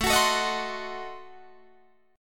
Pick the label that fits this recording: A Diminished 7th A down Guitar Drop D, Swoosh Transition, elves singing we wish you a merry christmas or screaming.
A Diminished 7th A down Guitar Drop D